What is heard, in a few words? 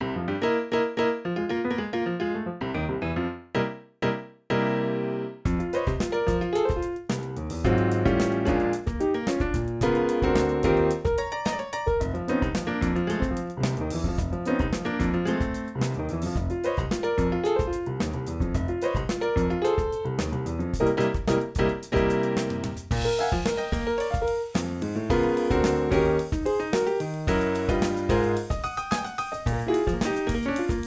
Funky mood, pianist